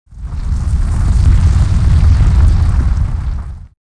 tremor, disasters; PC Gamer IT CD 40

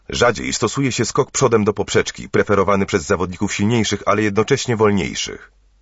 alto

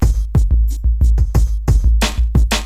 Drum loop, HipHop; LiveAct Pool 1

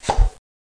elf puff; Santa's Workshop - Advent Calendar for Computers

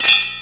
Sword Ring; Curse of Dragor Demo

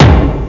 BAS DRM; Groovy Bytes: Behind the Moon; BAS PERC

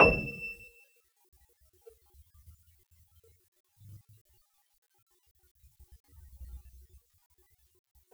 Wurly Upright Soft E6; Pro Sounds Sample Collection

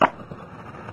match; Soldat

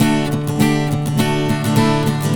Pop Action, Acoustic Guitars; ACID MUSIC

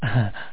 ahah

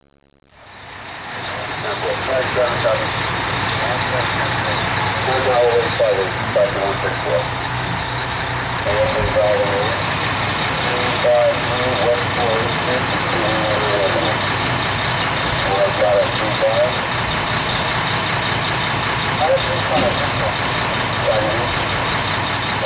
radio, fire; disaster

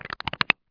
crack

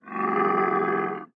desert; PHARAOH

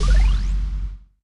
ball explode

